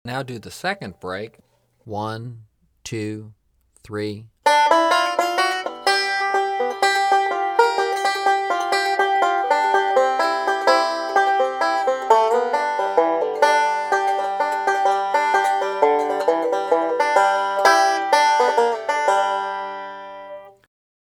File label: Hammer Break 2 @ 64 Bpm